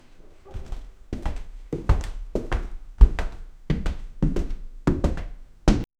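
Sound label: agressive footsteps coming; ambiance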